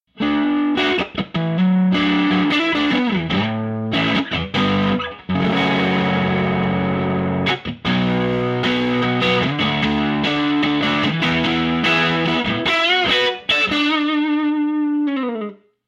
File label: overdriven